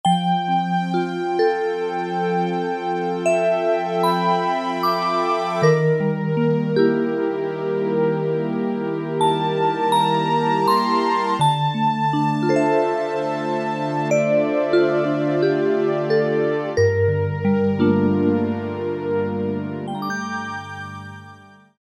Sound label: VIB STR SOFT, Casio VZ-10M; Internal factory combi